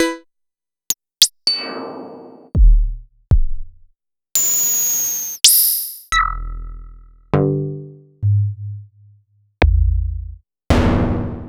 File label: Moog Voyager